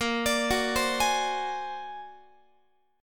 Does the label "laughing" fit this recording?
No